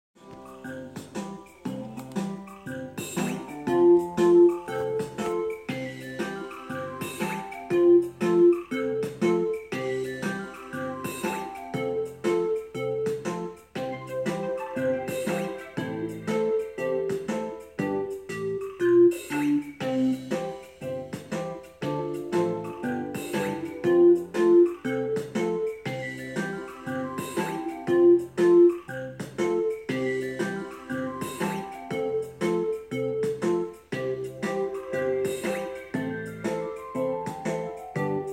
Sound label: Big wheel song karaoke